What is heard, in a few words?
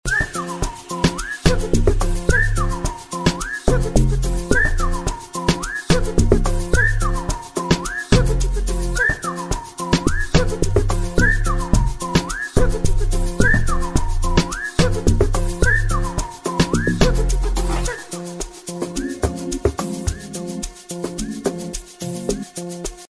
Ringtone